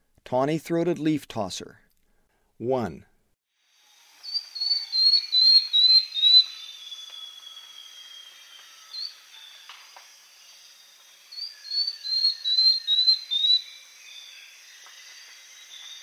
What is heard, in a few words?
Tawny-throated Leaftosser; S. America; Eastern Lowlands